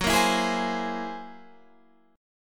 F sharp 9th Flat 5th F sharp Guitar Half Step Down